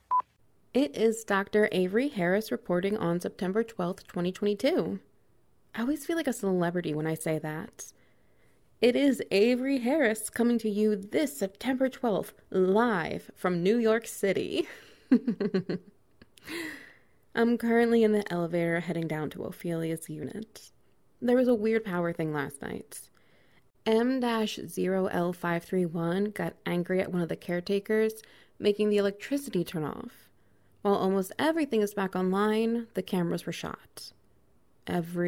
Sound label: SFX Wet Noises